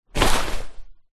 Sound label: wetsuit pick up; foley